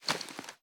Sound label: reload arm up, reloads; gmod